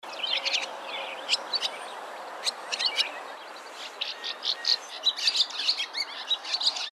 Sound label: SWALLOW BARN